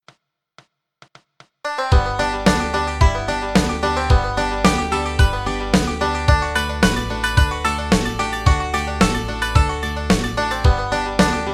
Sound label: Bluegrass Banjo G; Yellow Rose Of Texas